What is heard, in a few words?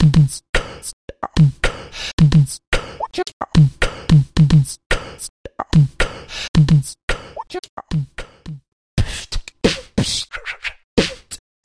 human beatbox